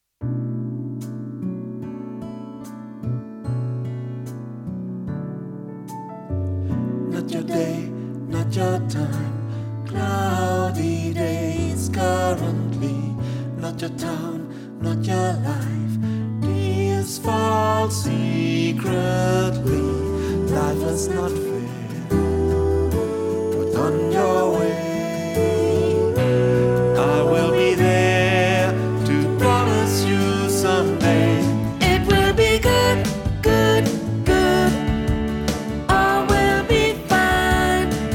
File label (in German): Sopran